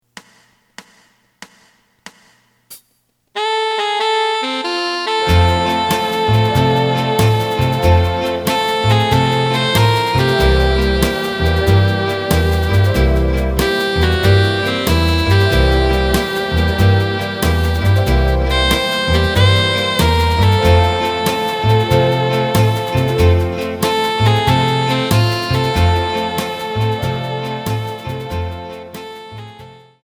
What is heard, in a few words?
Asax